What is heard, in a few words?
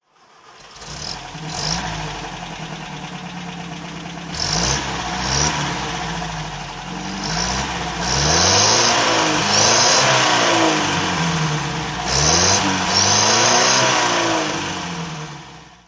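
MG VA, 1938, throttle; MG VA Tourer, 1938; Motorgeluid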